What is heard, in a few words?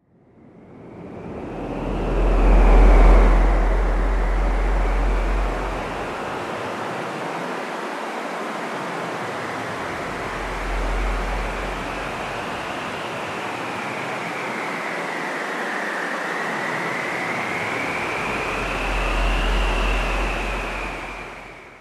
air